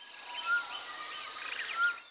jungle bird; ambient animal; Counter Strike Model Pack; CS:GO

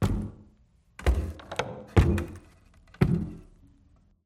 stumble wood, footsteps; ambient; Half Life Alyx

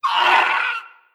waiting call, headcrab reviver; creatures; vox

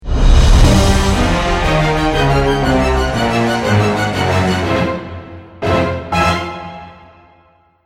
lost round, music

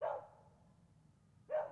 dog bark distant; Counter Strike Model Pack; CS:GO

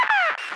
radio random, prison; Half Life Model Pack; Half Life 2; ambient levels

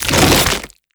open fast, zombie blind jaws; Half Life Alyx; creatures

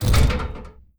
chainlink gate stop; world misc

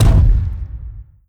step layer bass; combine; soldier charger

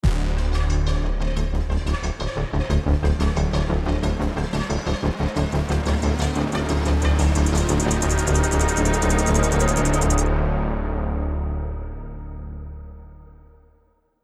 round ten sec count, music

